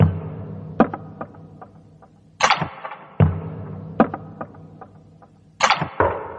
Percussion Loop 75 BPM; Cymatics; Eternity Sample Pack

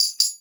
Tambourine; Cymatics; PHARAOH Beta Pack

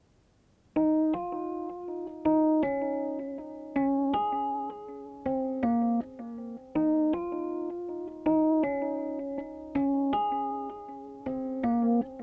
80 BPM F# Min Pluck, Lofi; Melodies; Loop Stems; Cymatics; GHOST (Beta Pack); Blue Wish

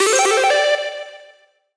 Impact FX; Video Game; Galaxy Rush; Cymatics; Video Game Sample Pack Vol 1

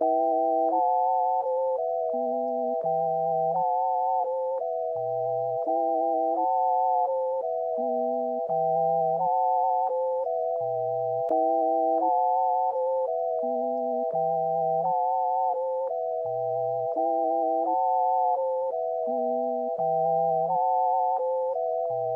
Sine Waves, 85 BPM E Min; Loop Stems; Cymatics; 2022 Melody Collection Platinum Expansion; Vibes

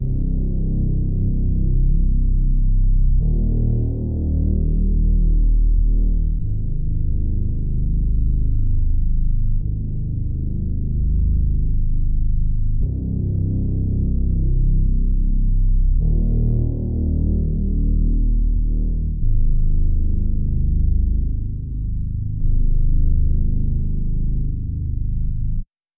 150 BPM C Min Bass; RnB; Loop Stems; Cymatics; 2022 Melody Collection; RnB Pt 2; Love You So

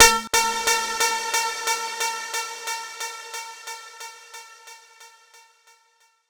Techno FX 135; Loops; Ghosthack; AC2024 - Day 1 - Techno Sounds 2025

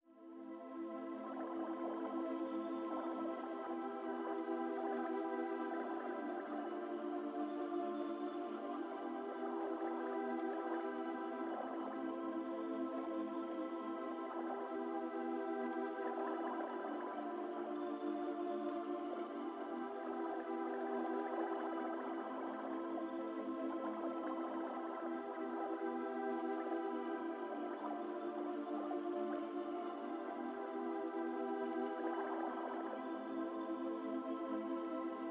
90 BPM A Min Pad; Melody; Loop Stems; Cymatics; 2022 Melody Collection Platinum Expansion - Pt 2; Two Sides

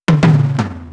Fill - 80 BPM, Drum Loops; Drum Fills; Cymatics; Eternity Sample Pack

